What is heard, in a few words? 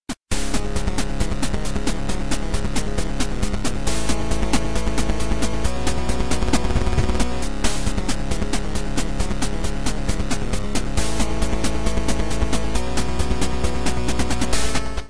8Bit; Deadbolt